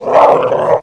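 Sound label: orc warrior die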